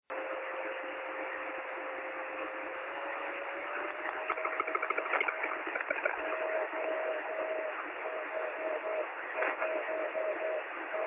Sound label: 23cm beacon; oh5shf